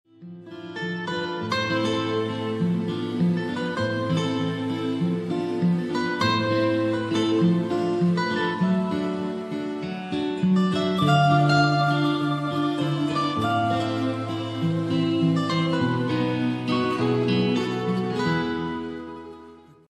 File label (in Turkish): bella ciao telefon zil sesi; bella ciao cav bella; la casa de papel